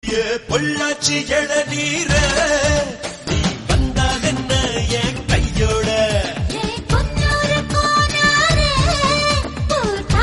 Tamil Song Ringtone; Attagasam

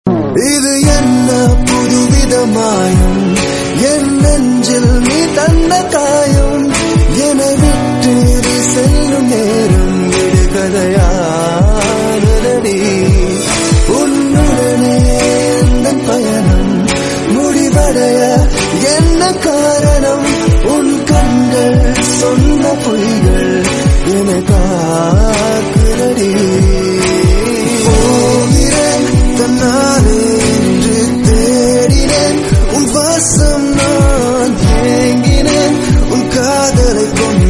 Love Feeling Song In Tamil Ringtone

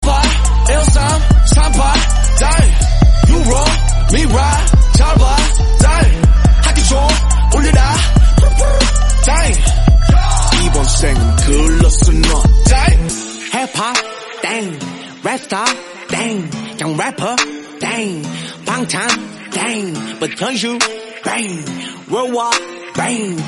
Korean Song

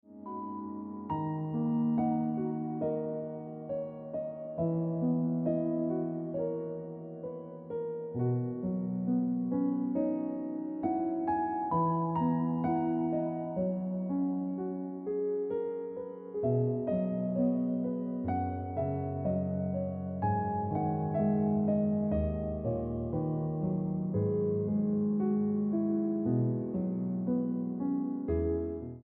Piano Music

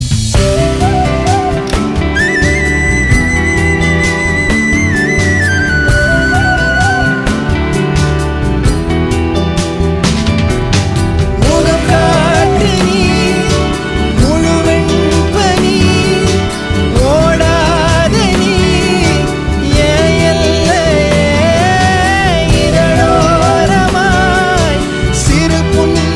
Tamil Song Ringtone

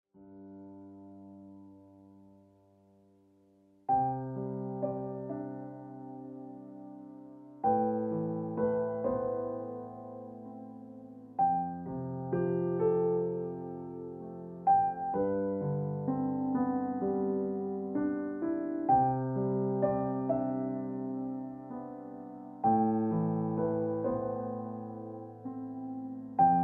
Ringtone Piano Music

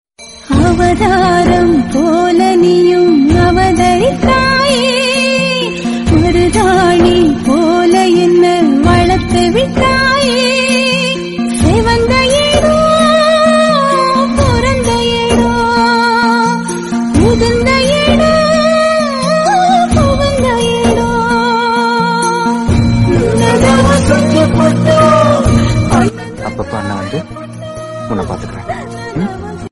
Tamil Song

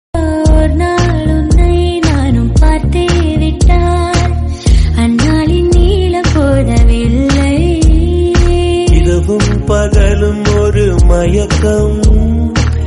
Tamil Song

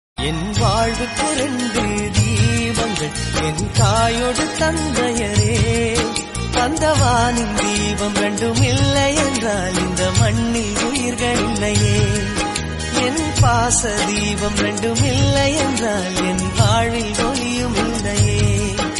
Wedding Anniversary Song Tamil

